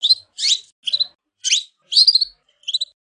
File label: ringtone canary